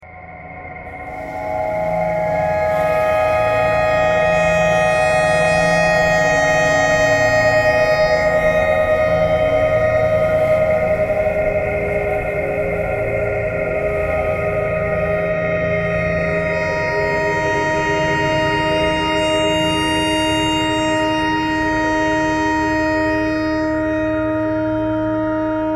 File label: clarinet notes e traffic kcore birds a thread pentatonic